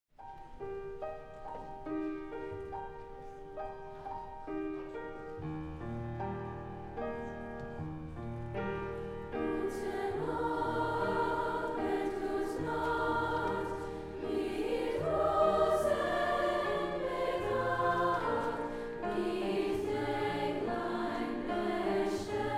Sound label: HIGH SCHOOL CHOIRS Holiday Concert; SENECA VALLEY; WIEGENLIED; J. Brahms